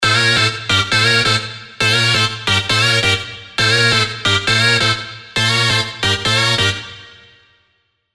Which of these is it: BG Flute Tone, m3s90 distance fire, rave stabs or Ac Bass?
rave stabs